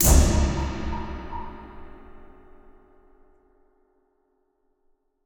Player Fast Death; fossil echo

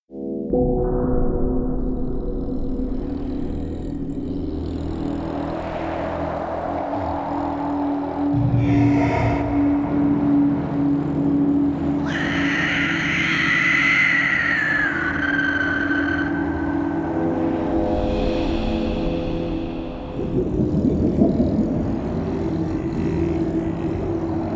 Interior Ambience; Pitfall The Mayan Adventure

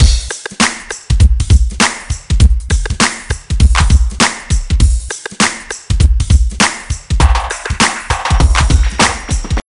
Boombox; ToeJam & Earl III: Mission to Earth

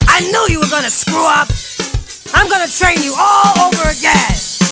Exiting Cool Mode

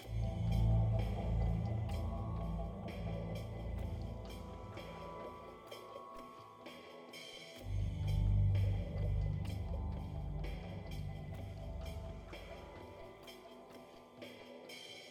musique gameplay defeat loop; gladiabots

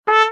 Trumpet; Draw Your Game

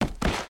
land

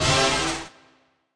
Quiz Answer; The Denpa Men; Android

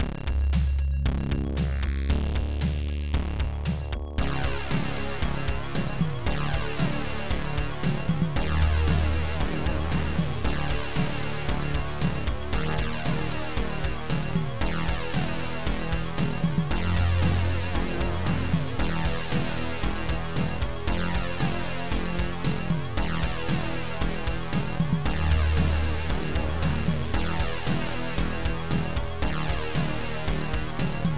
Level BGM; Cybersphere; MS-DOS

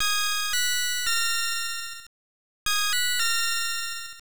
Defeat; Nobunaga's Ambition; NES

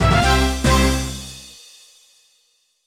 Jingle Yattane

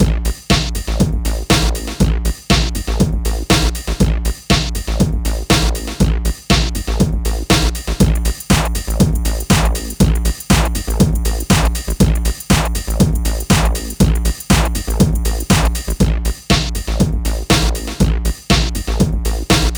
Drums; yager windows xbox gamerip 2003

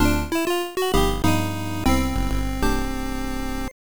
lose jingle; Super Medal Fighters; arcade

